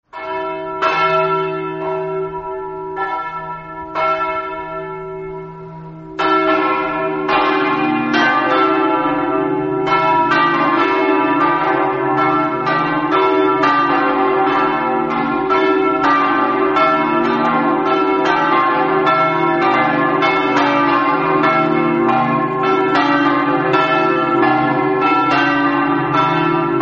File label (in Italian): pavia, campane